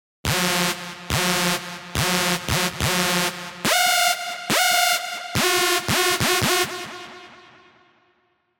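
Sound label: Lead, Sylenth1; Headlights; alonso 19 year anniversary pack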